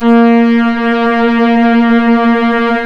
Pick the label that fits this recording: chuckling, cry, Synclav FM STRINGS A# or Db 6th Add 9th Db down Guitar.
Synclav FM STRINGS A#